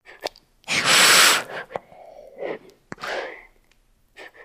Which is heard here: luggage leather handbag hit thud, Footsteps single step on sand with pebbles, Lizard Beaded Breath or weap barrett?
Lizard Beaded Breath